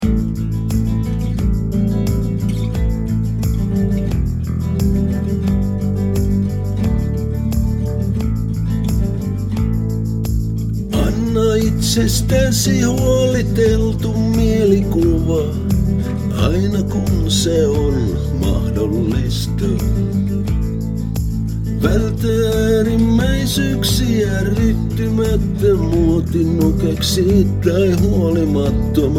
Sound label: Bass